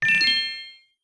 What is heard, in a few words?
Get Rupee Blue; Pick up a blue rupee (Recorded)